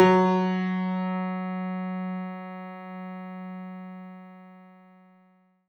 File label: F#3, synth